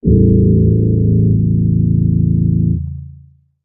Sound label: Hit Tonal Rock Bottom